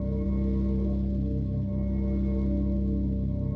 destroyer robot loop; fight

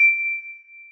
celesta, programmable speaker

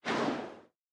throw projectile; fight

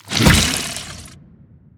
spitter death big, creatures

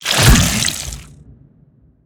spitter death behemoth, creatures

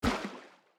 landfill medium; Factorio

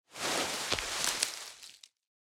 tree leaves; particles

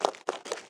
biter walk, creatures

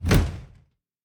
cargo wagon close; Factorio

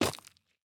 stones, walking, pebble; Factorio

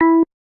bass, programmable speaker

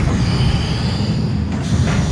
centrifuge; Factorio

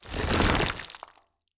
worm standup small, creatures

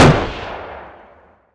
heavy gunshot; fight

